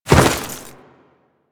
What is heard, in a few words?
Step Ice, Footsteps; Warframe; Grineer